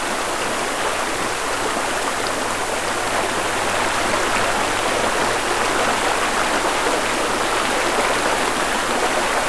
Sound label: stream